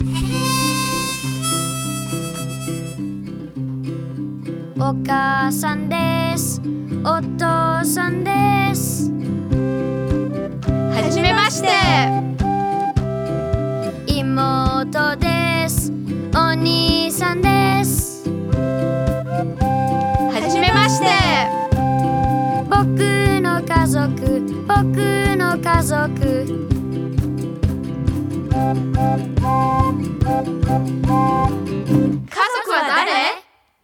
song japanese

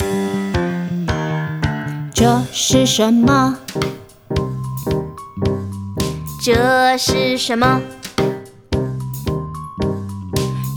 song chinese

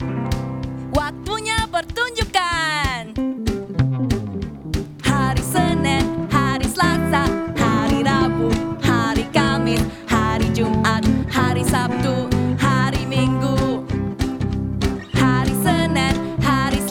song indonesian

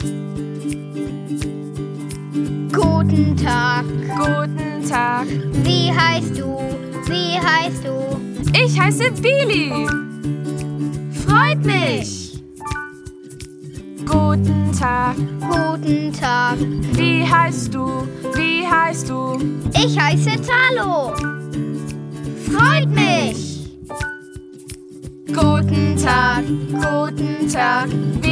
song german

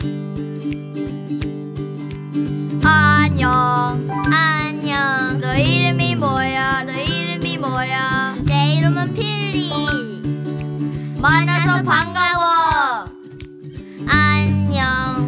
song korean

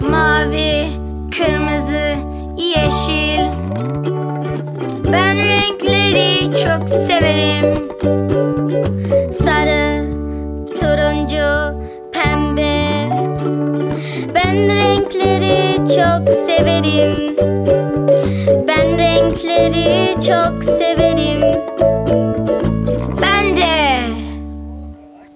song turkish

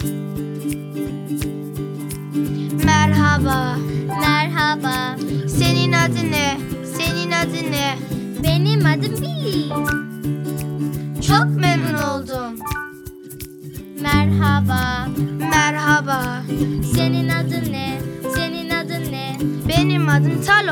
song turkish

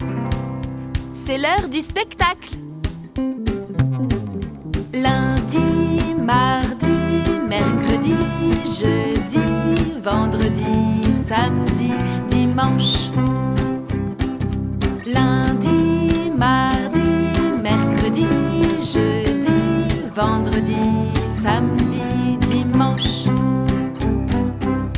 song french

